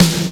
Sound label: Snare, EMU PX-7 Drum Machines